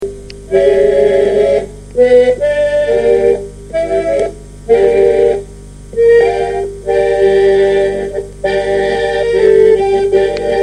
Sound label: song